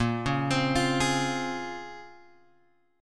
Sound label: A sharp Minor 9th, A sharp; A#m9 Chord {6 4 X 5 6 4} arpeggiated